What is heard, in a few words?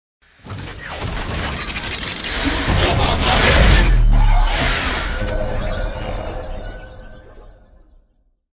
transformer sound; optimus prime